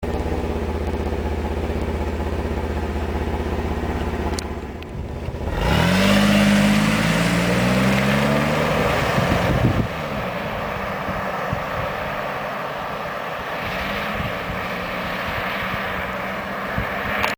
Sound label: engine before, delica